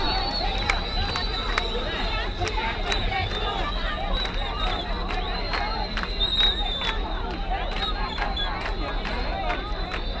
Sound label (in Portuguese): Demonstracao na Rua; Brasil (O-Ton); Fieldrecordings